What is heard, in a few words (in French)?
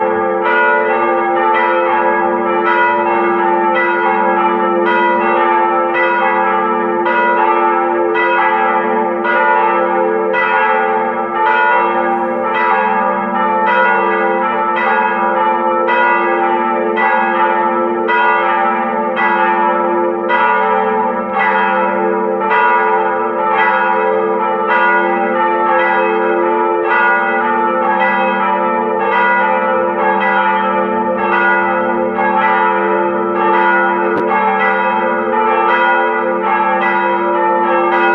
Cloches Cathedrale Ajaccio